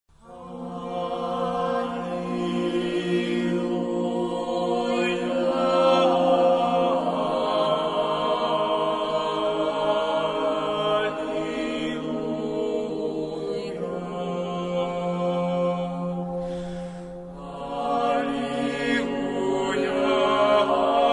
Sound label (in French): Aliluia Chant géorgien